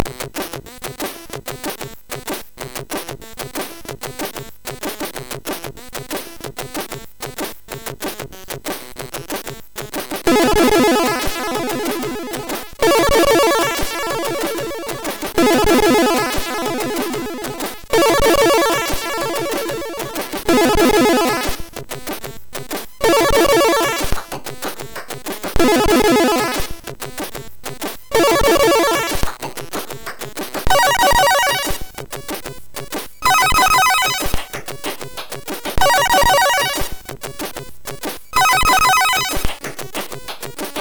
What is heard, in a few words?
Quicky Intro, MOS6581; hvsc